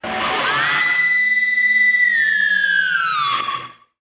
Music E Guitar Weird

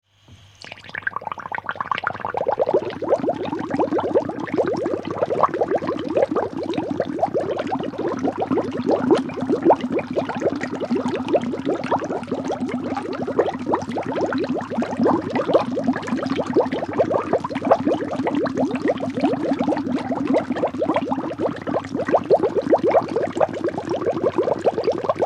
Bubbles Small Consta

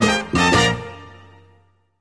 Music Effect Latin, jazz

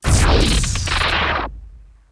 Starship 1 Overhear

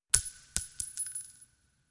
Shell Casings Single

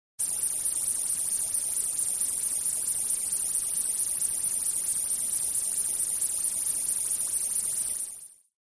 Noise Extraterrestr

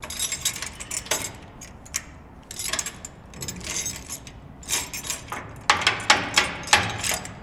Key Mvmt Into Lock, lock & keys; Key Movement and Into Lock

